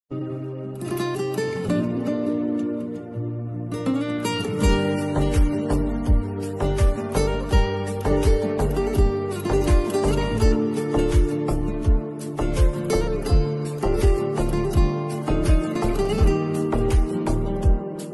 hindi ringtone mobile phone ringtone love ringtone